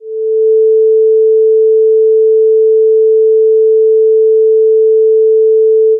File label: sine 440Hz